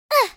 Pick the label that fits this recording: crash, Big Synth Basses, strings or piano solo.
crash